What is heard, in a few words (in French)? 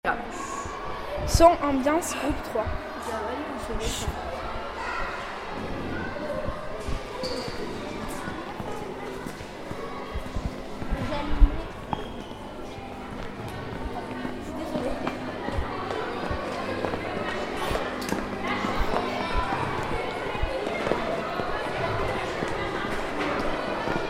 AMBIANCE DU THEATRE AU CINE